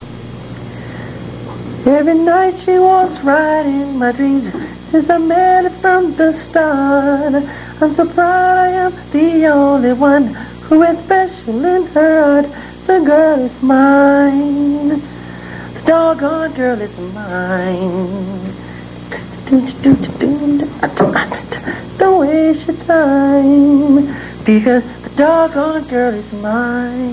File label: girl acap